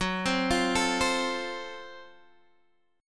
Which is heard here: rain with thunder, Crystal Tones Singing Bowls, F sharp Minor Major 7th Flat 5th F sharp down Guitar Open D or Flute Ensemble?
F sharp Minor Major 7th Flat 5th F sharp down Guitar Open D